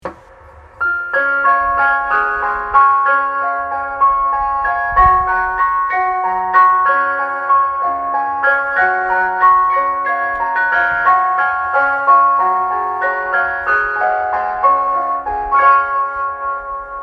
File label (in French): musique camion